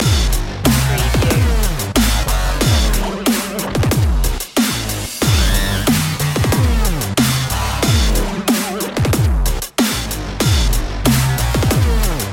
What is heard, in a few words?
dubstep of frying cpu loop